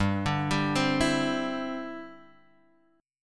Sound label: G 6th Suspended 4th; G6sus arpeggiated; JGuitar